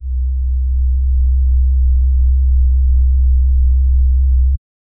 create a deep cinematic d, drones

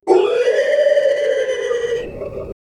funny horror time finishe, game

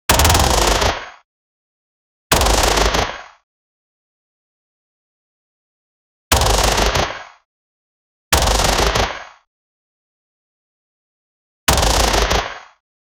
gun sound effects, firearms

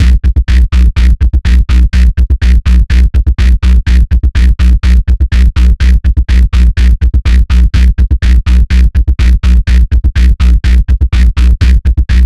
a fat electric funk bass

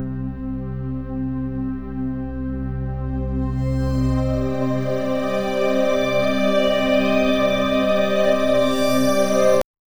make it more instrumental, ascending

